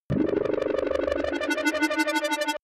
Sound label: general overload; energie